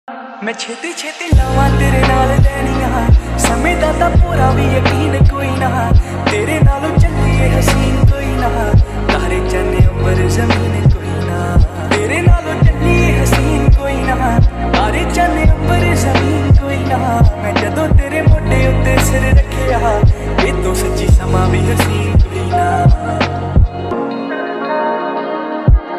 lofi ringtone

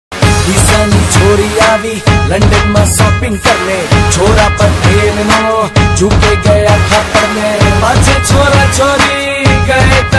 punjabi ringtone

